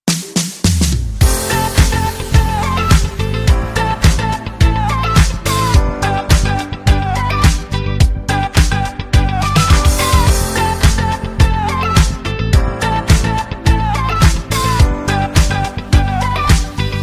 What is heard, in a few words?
Instrumental Ringtone